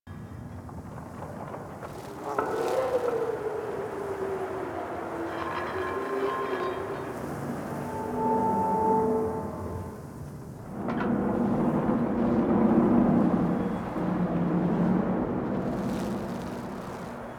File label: Town Foreground Amb Loop; Exterior; Ambience; Duviri; Warframe